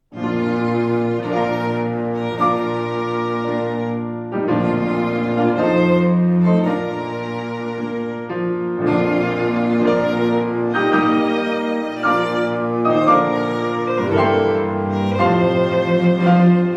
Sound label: Piano Violin Cello